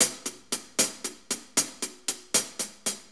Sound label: 6-8 HiHats, HiHat Ride Loops; Drum Tools